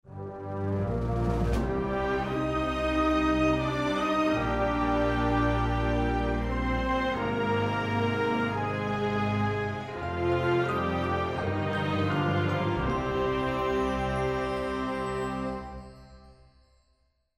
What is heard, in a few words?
Music